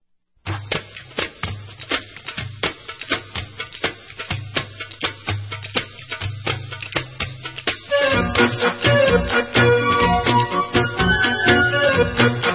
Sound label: Debka, Instrumental; Debka Allon